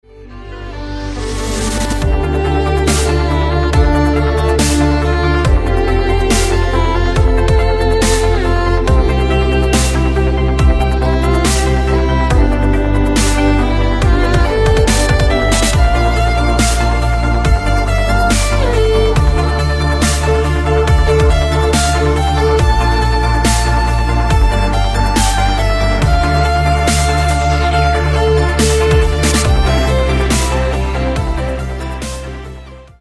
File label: Crystallize Violin